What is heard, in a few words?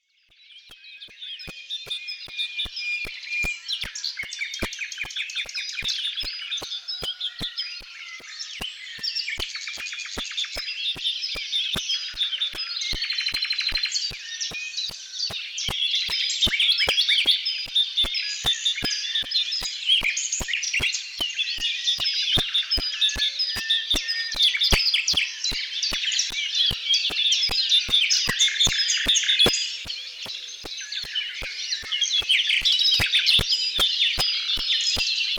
birds